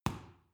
boxing paw hit soft; boxen

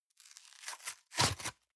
box small tear apart medium; industrie wirtschaft; bueroarbeit

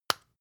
floss open hard; haus-garten; badezimmer

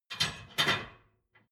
dishwasher frame fold down; maschinen; spuelmaschine

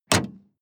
sports car ext bonnet close

